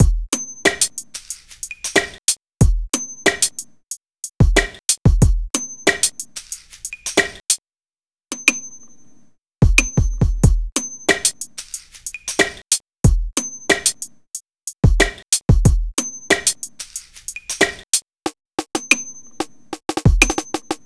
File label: Hip-Hop Drumloop